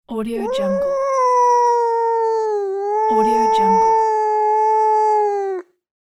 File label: cat yowl; catsoundstudio